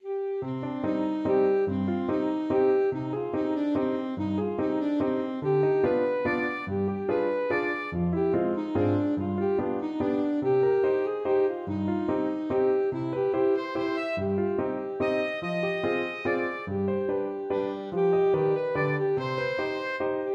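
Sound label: swallows waltz alto saxophone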